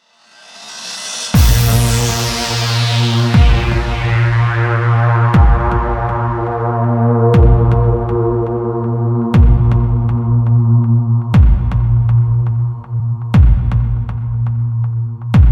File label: Music Pulse Loop 120BPM; gamerip; Zombie Army Trilogy; PS4